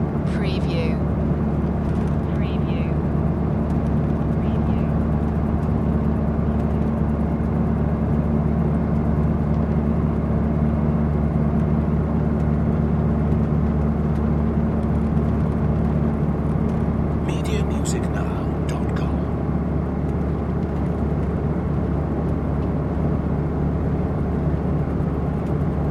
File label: travel bus ambience; loop